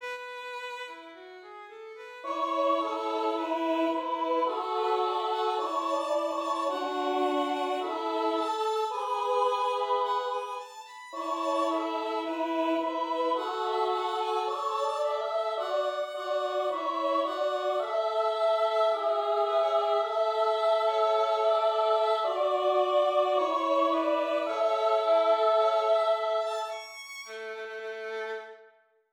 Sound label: choir; enter; 1부 입례송